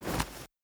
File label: ui inventory close